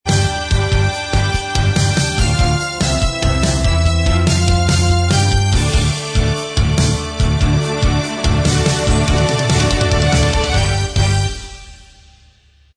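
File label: theme instrumental track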